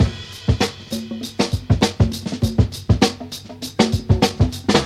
100 bpm drum loop a sharp key, Free drum groove - kick tuned to the A# note. Loudest frequency: 1310Hz; drum loops